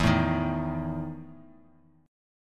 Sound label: E Major 7th Suspended 4th E down; EM7sus4 strummed